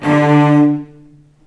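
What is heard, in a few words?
D2 arco long cello, left; East West; The Ultimate String Collection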